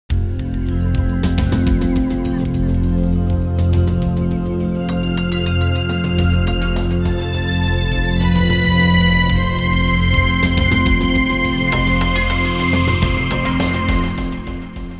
adventure music

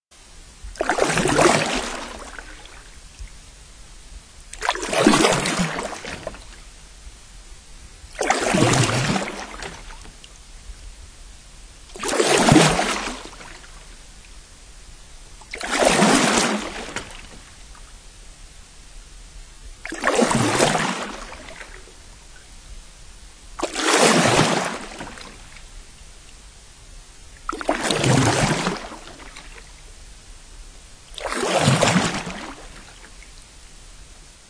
canoe paddling

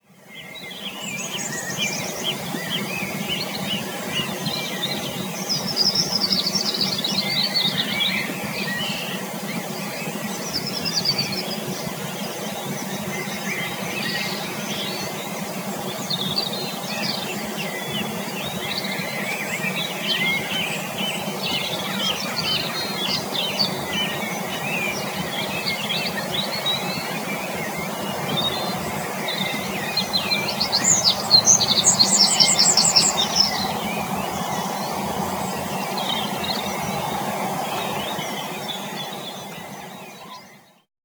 birds many

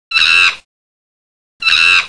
chimpanzee

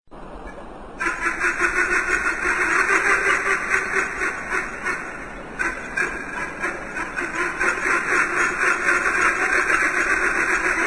guineahen

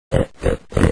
pig

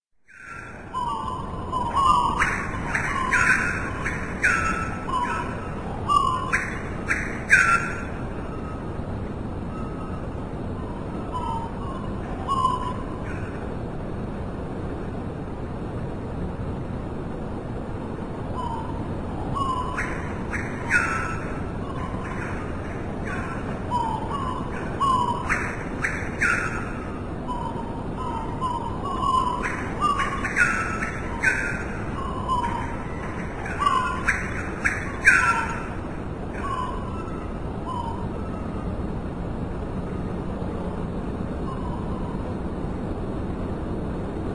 south america morning ambience

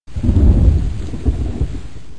thunder rain

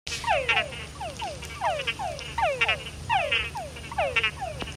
tropical frogs

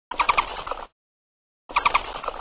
bird flying

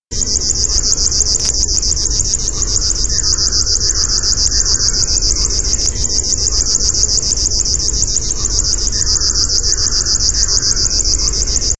locustella fluviatilis

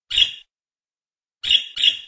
bird chirps

